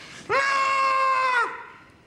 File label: naaaaah